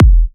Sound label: Bassdrum